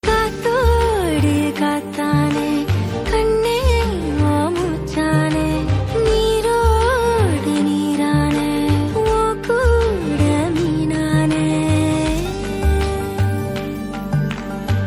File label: ringtone female version; tamil; jail